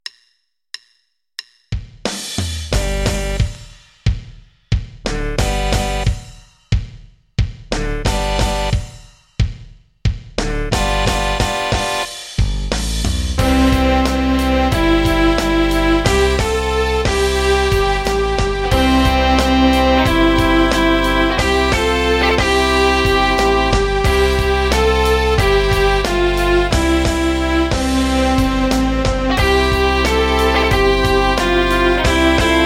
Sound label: accomp slow